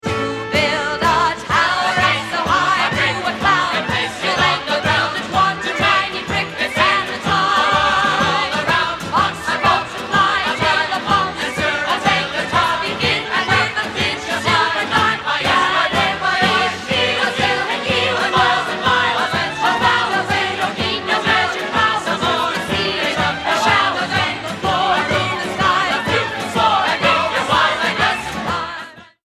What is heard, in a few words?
polyphony